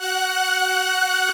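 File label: synth strings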